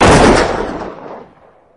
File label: gunshot